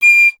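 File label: FLUTE PICCOLO D; Miroslav Vitous - Solo Instruments VOL-2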